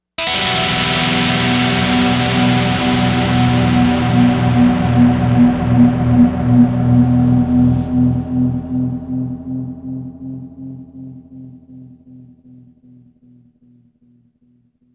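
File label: dirty guitar e1005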